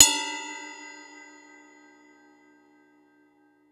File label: CYMB; Houseworx; Drums; Cymbal